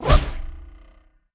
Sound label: Special Activation; Globe Bird